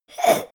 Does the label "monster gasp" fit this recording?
Yes